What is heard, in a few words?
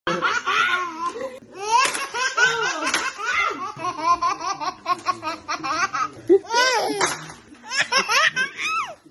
Baby Laugh